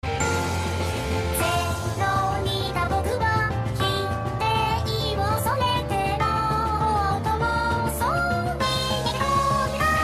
intense voice ringtone; miku

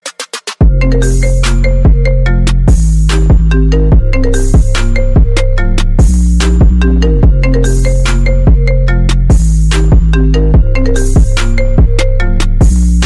iphone ringtone

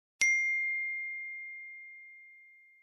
ding ringtone; iphone 5s

